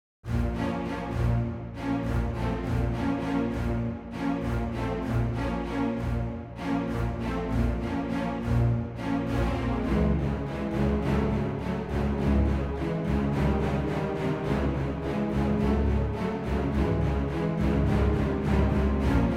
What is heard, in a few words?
Film Score Soundscape Minor Joy